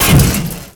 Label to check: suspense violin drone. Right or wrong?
Wrong